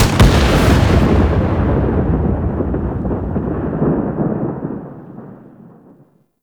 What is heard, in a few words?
Archwing Space Explosion Close; Warframe